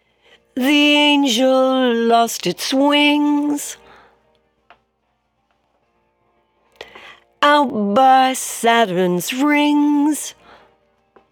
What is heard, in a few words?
vocal lead